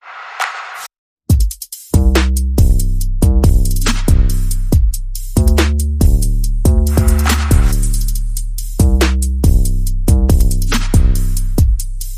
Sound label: Percussion Bass and Effects